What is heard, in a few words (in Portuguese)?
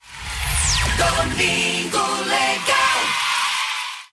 vinheta; domingo legal